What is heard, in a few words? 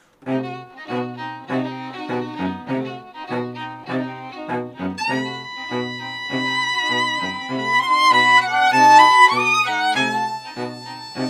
Strings